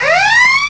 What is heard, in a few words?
Mine Warning; Mortal Pongbat 1.4.1 68K